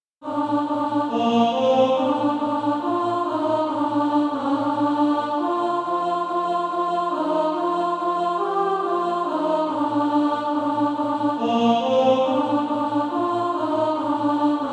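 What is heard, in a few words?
alto, Practise Music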